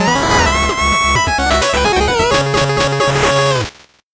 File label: 8bit jingle normal